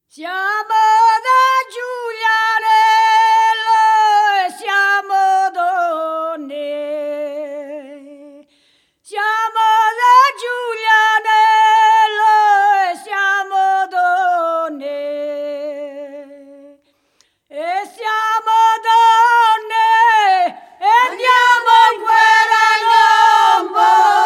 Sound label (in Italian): Canto Di Lavoro; Siamo De Giulianello